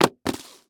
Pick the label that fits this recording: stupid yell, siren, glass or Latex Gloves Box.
Latex Gloves Box